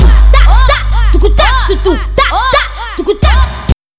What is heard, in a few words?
Beat; Brendo DJ